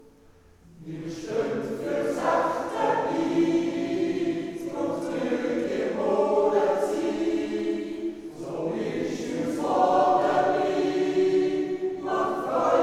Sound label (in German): jodler